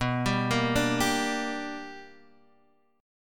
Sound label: B-Minor Major 7th Sharp 5th B down Guitar Standard; BmM7#5 arpeggiated; JGuitar